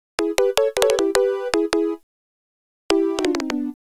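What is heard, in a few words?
Flutes & Swingers Keys E; Zero-G Kocktail Kollection; Construction Kitz (Lounge); Flutes & Swingers 156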